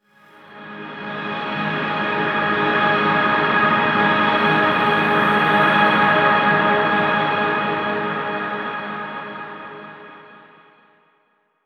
Dust Wind G#, Ambient Pads; Zero-G Seismic Frequencies; 90 s Sample CDs

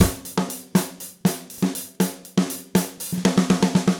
Gretsch groove 90 BPM, 1967 Gretsch Kit drums; 120 BPM; Sonic Foundry (Sony Creative Software); Vital Drums

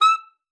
E6, saxophone; Best Service ProSamples vol.42 - Session Instruments

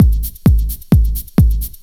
drum 130 straight; Techno Trance Essentials

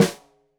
Snare Stereo, Drums; Deeply Sampled Drums

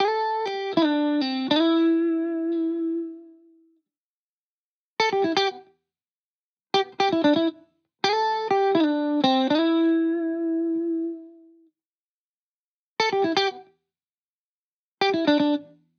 Guitar Bpm120 Key A minor, 70s Guitars; Fox Samples - Back to the 70s Guitars

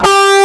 EG DIST BG3, FUNK

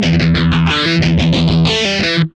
GUI 90 LICKS

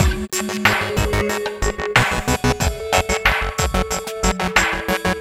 Beats, Synchro Funk; Finky Five; Sonic Foundry (Sony Creative Software)

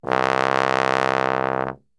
C1 BASS TRB, Brass; Best Service; Brass Super Section

